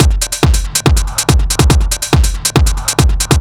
Overdrive, Drums Beats

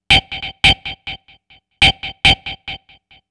NRG Percussion, Trance Drums

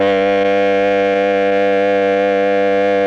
OBOE; ILIO; Propeller Island Legendary M400

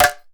EMELAKO HITS; Best Service; World Colours

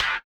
BUZZER; Spectrasonics; Metamorphosis

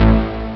synbaz; ST-XX Amiga Soundtracker Sample Packs

